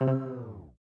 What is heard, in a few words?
SD fail; Samsung SGH-i320; System Sounds